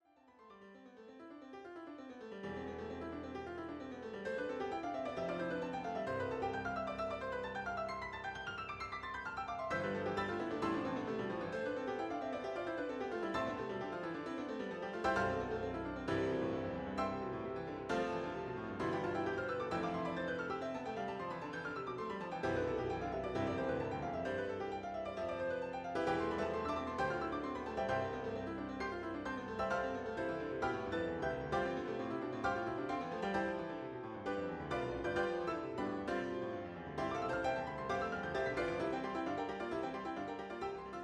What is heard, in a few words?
4 Hand Piano